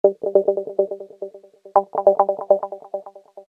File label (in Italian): chitarra